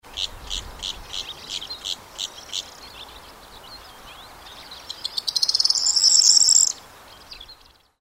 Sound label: corn bunting